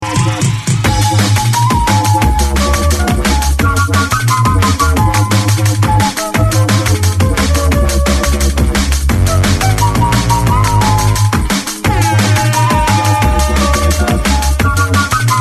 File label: flute rhythm; santorini; jungle